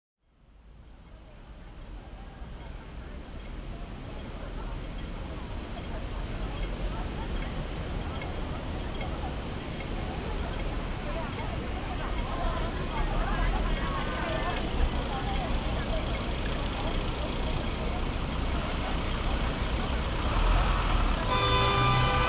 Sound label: Pure Binaural Recording - Street Sound Hong Kong Central